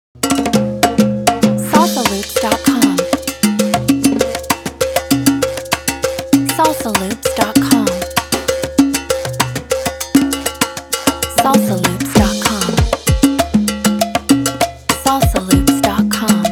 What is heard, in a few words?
Salsa Loops Perc Only